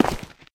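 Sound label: cleats concrete, footsteps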